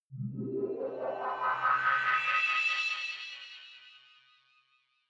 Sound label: energy generator start settle